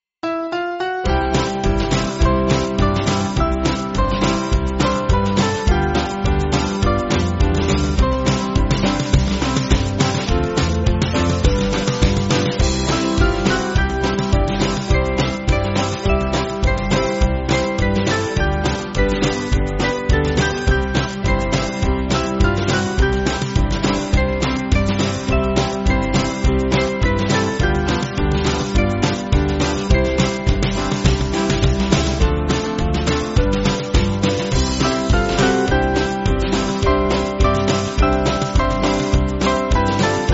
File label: Band, Backing Files for Churches; Oh Yes Im Saved; Loes